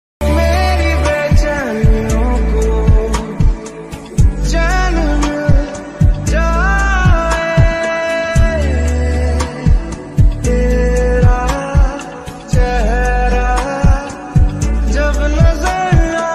ringtone song hindi, romantic ringtone, sad ringtone, love ringtone